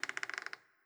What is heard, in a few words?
Sarco Squeak; Legend of Keepers